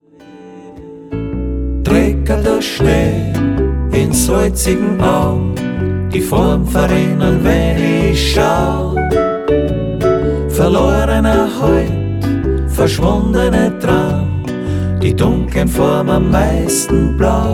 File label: meditative